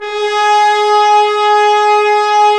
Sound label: BRS, Roland LA Composer; Tbns